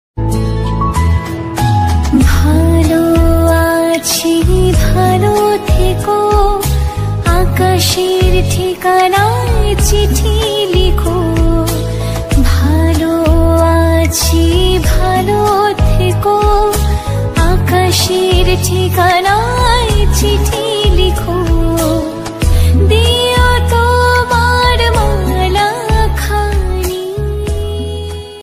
rabindra sangeet song

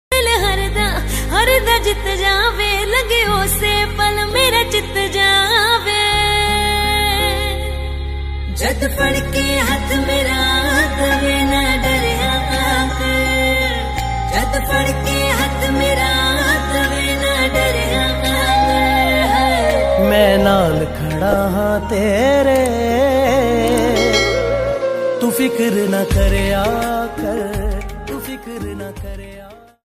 punjabi song ringtone

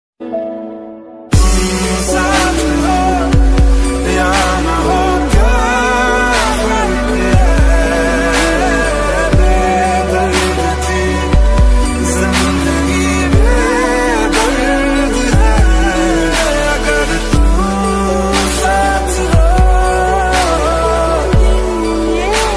hindi ringtone

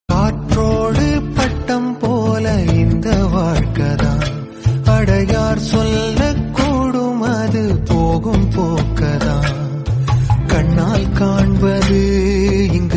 Tamil

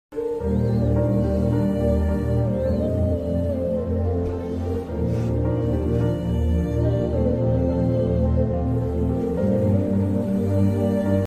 tamil sad bgm; sad grils

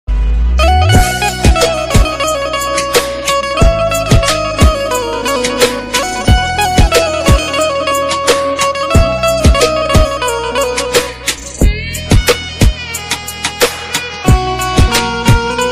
gujarati ringtone; બનજ રગટન

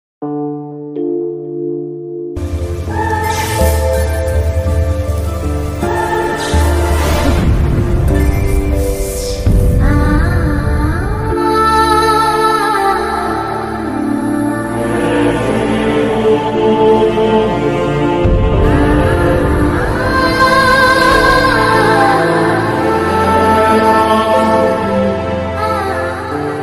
love bgm ringtone, tamil; maaveeran movie; famous bgm ringtone

